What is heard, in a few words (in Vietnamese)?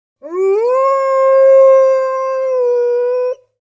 một tiếng sói tru